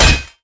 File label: metal medium chop metal; Warcraft III Frozen Throne